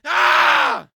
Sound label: male scream; npc; DeadFright